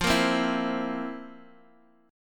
F Major 7th Suspended 4th, Guitar, Open G

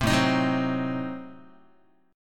A Diminished 7th A down Guitar; Adim7 strummed; JGuitar

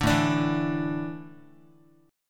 A Suspended 4th, Guitar; Asus4 strummed; JGuitar

